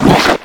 vacus hit; combat enemy; CosmicRage

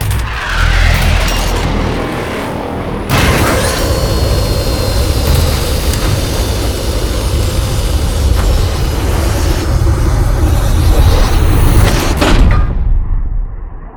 land, combat suit; CosmicRage; CosmicRageSounds

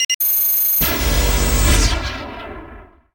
vehicle relocate

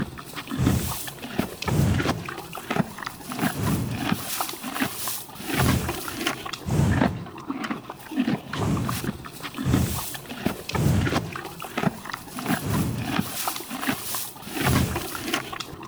eat, horses

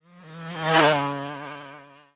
car, highway old car

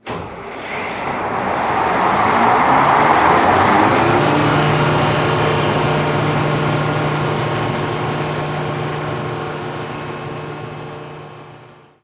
atmospheric generator; CosmicRage; combat

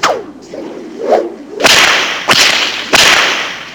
tail whip, dragons; general combat

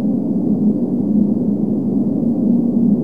grabber, ambiances

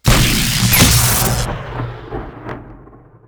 electric, ships combat; Cosmic Rage

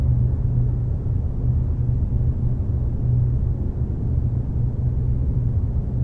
factory control room, ambiances; Cosmic Rage

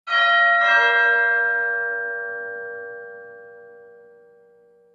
doorbell; house; CosmicRage; CosmicRageSounds

